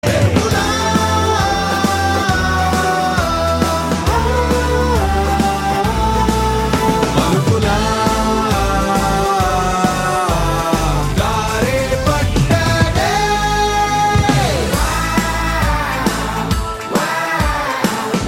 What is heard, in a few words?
humming; Raja Vikramarka